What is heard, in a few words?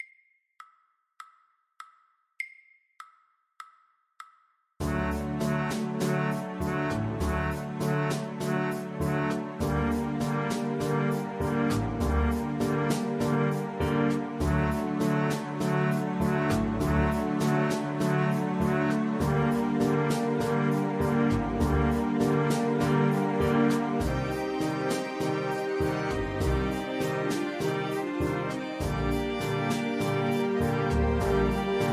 Trombone